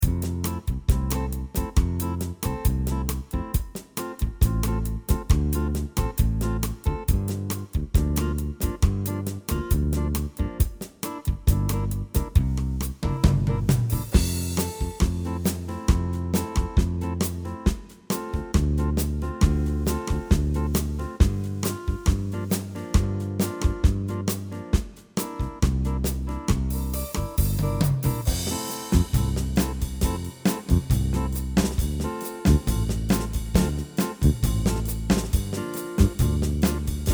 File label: Bossa Beach